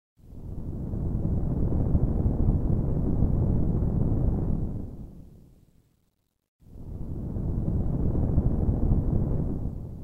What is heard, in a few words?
water; Swing a Club facing cancer